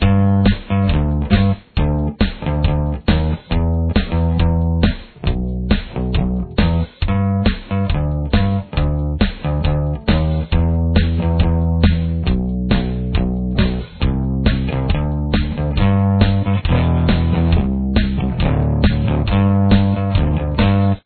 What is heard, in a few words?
bass